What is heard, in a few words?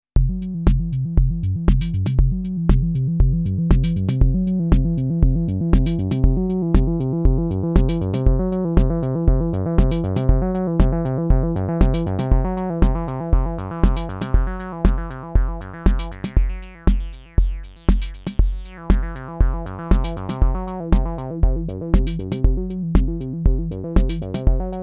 Akai Rhythm Wolf Bass Synth